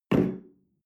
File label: espresso place tablecloth slide short, restaurant cafe